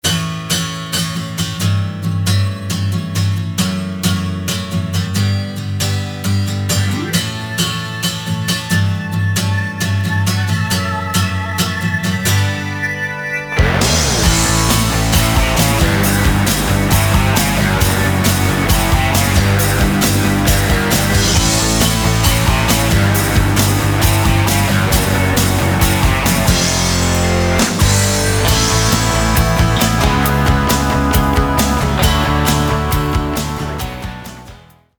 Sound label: rock